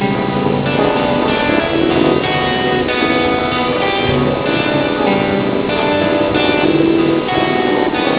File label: musical